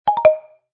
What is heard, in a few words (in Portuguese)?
notificacao